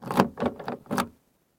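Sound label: crunch of closing and opening car doors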